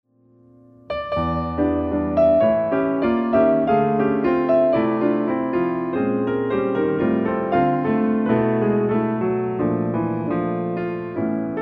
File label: ewi piano duet